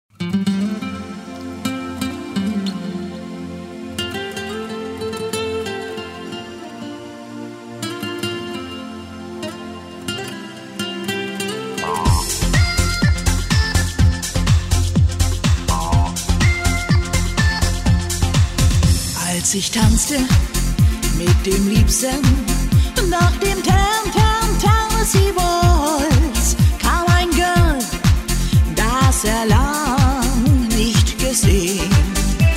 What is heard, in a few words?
Waltz